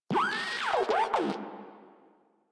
Moa Compassionate Vocal Affirmation, Pets; Warframe

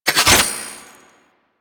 Glaive Equip; Warframe; Tenno; Teshin

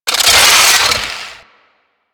Drone Blade Jump; Weapons

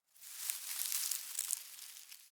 Forest Open Glade Grass Move Close; Ambience; Day

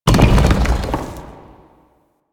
Giant Rock Start; Ambience; Man In The Wall

